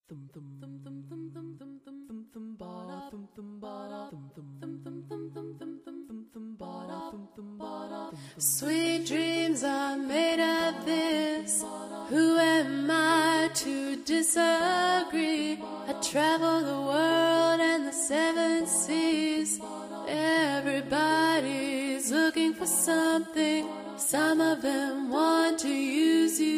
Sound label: Lead